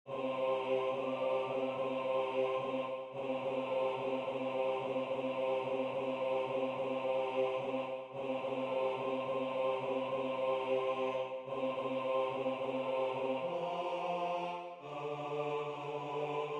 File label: bass; In That Poor Stable; Waiheke Island Choral Society